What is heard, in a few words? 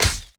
lights off; sherlockhd